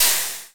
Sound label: coals step; Crypt of the NecroDancer